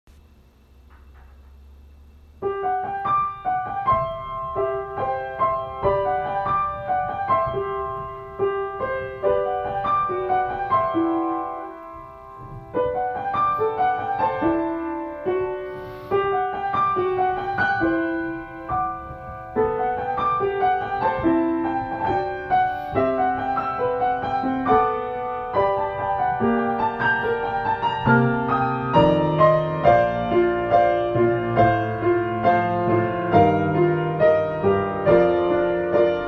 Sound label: soprano audition accompaniment at tempo